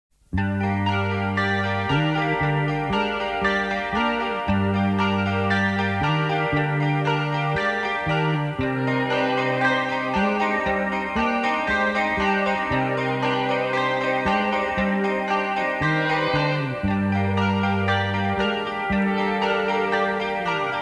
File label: Guitar